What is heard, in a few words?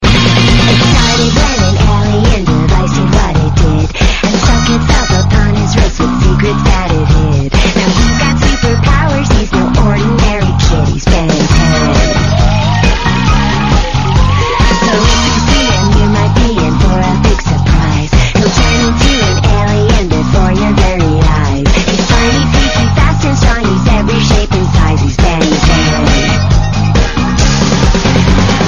funny cartoon background music tone ringtone